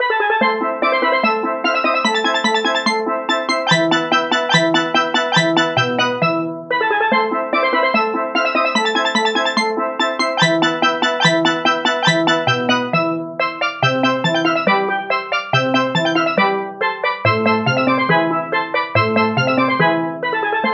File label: steel drums